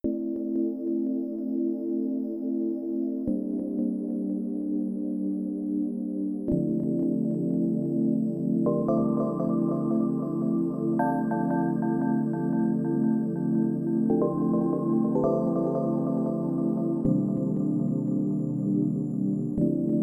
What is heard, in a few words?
sampled bell pad